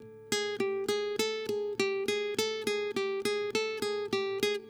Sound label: Guitar, Acoustic